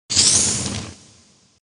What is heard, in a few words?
Collect Fire Egg; Banjo-Tooie